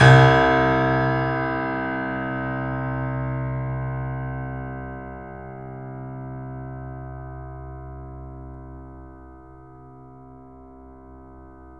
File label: The Piano, sustain; YAMA SUSTAIN; InVision Interactive